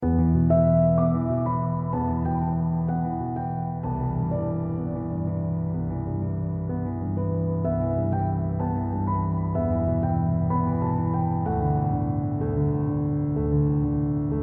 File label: Loop (189bpm)